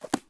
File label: Book Close; Civ4 beyond sword